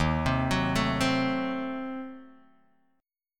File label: Eb Diminished 7th Eb, Guitar Drop D